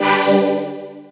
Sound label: start rec, camera sound; Sony Ericsson W8